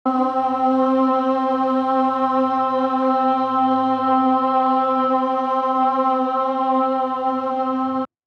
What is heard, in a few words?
pitch C